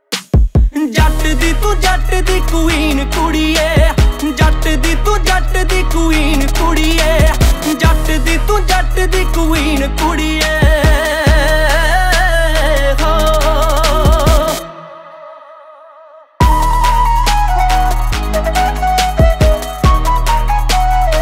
Cool Punjabi Song Ringtone

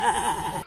Laughing Notification

